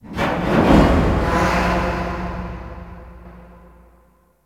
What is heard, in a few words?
Cargo Elevator Distant Metal Groan; Warframe; Guild